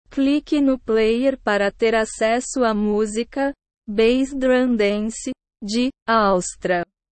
bass drum dance

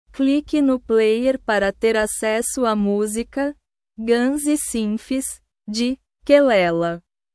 synths

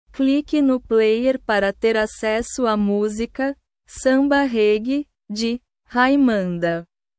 samba reggae

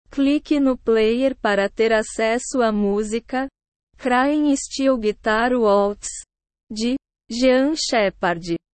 crying steel guitar waltz

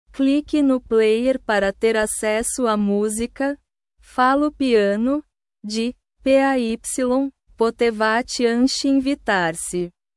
piano

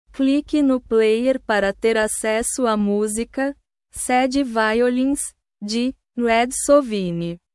sad violins

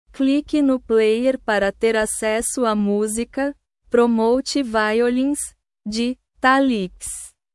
promote violins

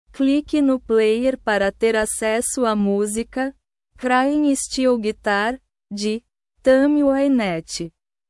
crying steel guitar

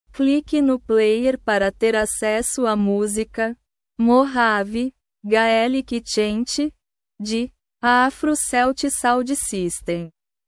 mojave gaelic chant

